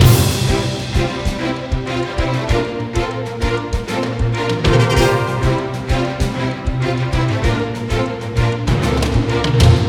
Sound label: Choose Horse; netent